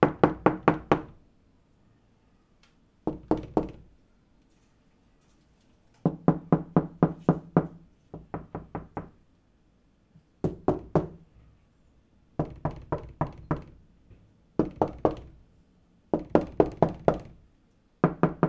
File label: Door Wood Knock; Lucius III; pc linux